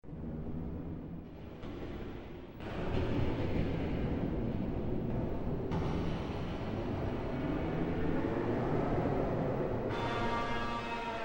Mechanical Metallic Machine Breaks Down Building To Impacts; Factory